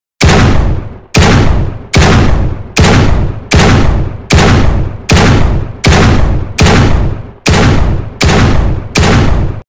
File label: sword gun rivals